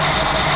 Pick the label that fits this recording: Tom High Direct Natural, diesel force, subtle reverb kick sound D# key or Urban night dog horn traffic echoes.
diesel force